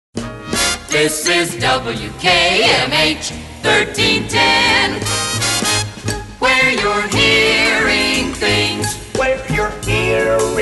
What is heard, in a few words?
Radio Jingles; WKMH